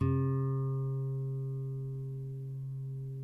NYL NV D, nylon no vib guitars; Spectrasonics; Hans Zimmer Guitars VOL-1

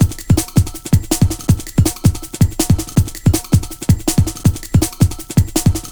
162, Jungle Breaks; Tearin; Jungle Warfare 1&2

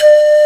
pan flute C6; Greytsounds; Scott Peers Sound Engineering

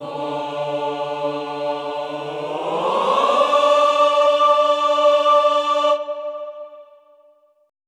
GLIS D3-D4, Classical Choir; Best Service; Extended Classical Choir; GLISSANDI

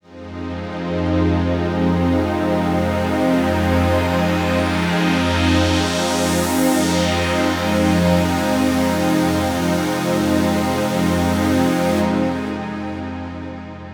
pad lofi F

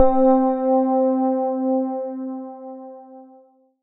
KEY OBXA C4, Oberheim OB-Xa Keys, Synths

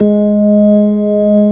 ORG B3, organs; Sound & Vision; Gigapack I CD 2

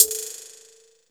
HH CLOSED, Disco House

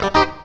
FUNKY, Grooves

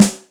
Rock, Yamaha DTXpress Acoustic Snare

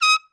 TRUMP SRT, TRUMPET; Best Service; Killer Horns

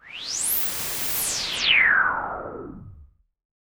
Virus Noise

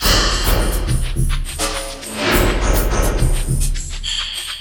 PLAS LP, Industrial Loops; USB Soundscan vol.24; AKAI

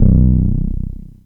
Synther B, Basses; Total Drum&Bass

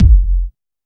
KICK, Techno 138 BPM; Best Service ProSamples vol.54; AKAI

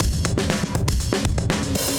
Beat Style, 120bpm, breaks

